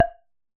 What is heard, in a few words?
Wooden Bar Strike